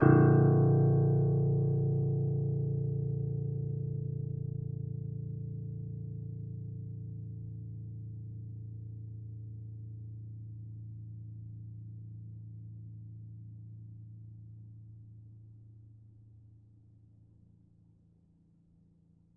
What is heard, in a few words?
Upright Piano, Sustains, A1; Chordophones; Knight